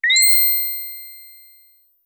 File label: pitched so high; message tones